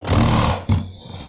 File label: Pet Croc Happy; creatures pets; Lego